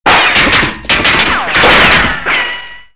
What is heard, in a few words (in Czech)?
kulky; Mafie 1